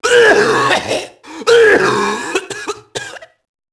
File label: mobster noise; enemy; Max Payne